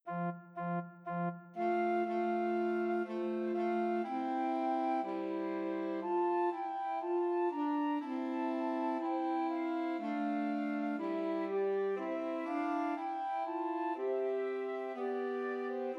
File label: Trios for Flute Alto Sax and Tenor Sax; Christmas; O Come All Ye Faithful